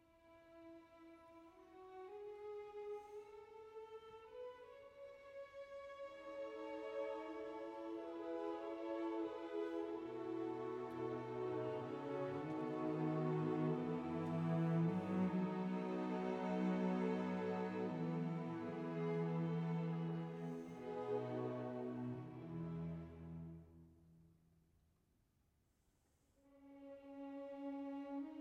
Piano Concerto, Orchestra; Piano Concerto No 1 in E Minor Op 11 II Larghetto

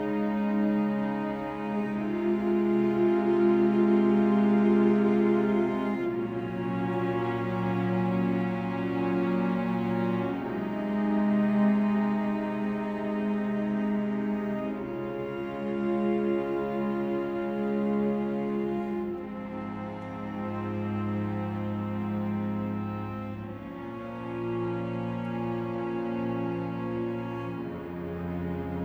Orchestral Version

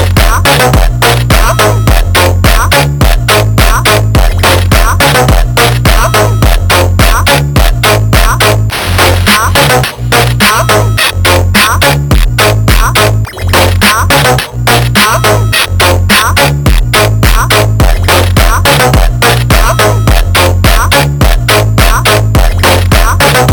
SLOWED REVERB

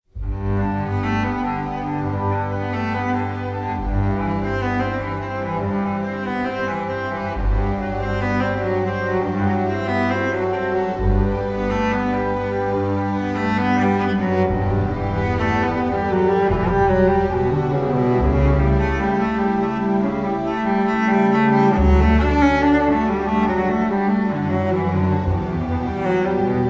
violoncello solo in G major, Prelude; Suite No.1; BWV 1007; Classical

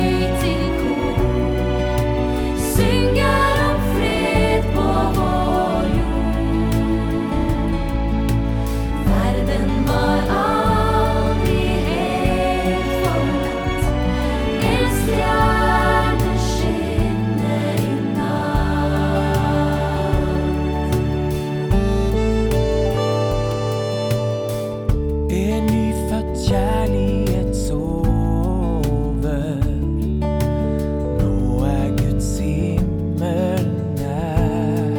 Gospel Choir

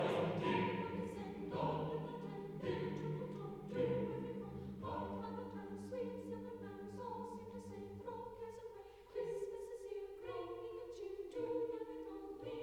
Carol of the Bells, Choir; arr. P.J. Wilhousky; Classical

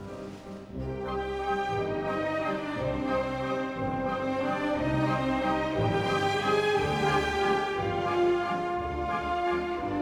Jazz Suite Waltz, Orchestra; Jazz Suite No. 2; Waltz II

